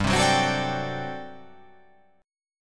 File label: G Major 7th Suspended 2nd Suspended 4th G down